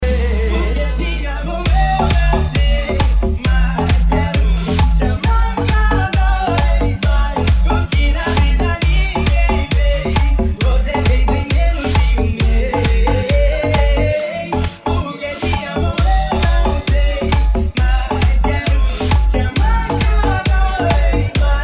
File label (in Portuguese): eletro e viola especial de eletro funk